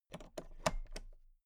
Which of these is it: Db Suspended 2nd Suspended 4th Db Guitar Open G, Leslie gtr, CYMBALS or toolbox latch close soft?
toolbox latch close soft